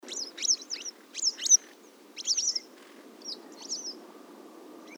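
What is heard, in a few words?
blue-headed wagtail beema, ulytau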